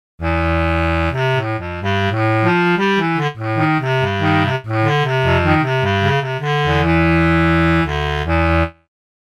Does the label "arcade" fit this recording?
No